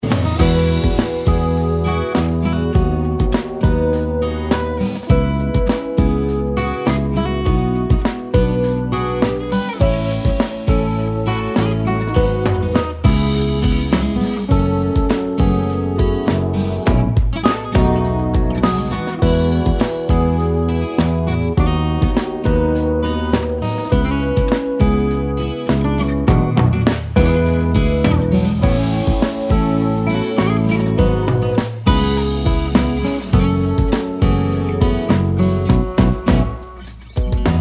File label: Instrumental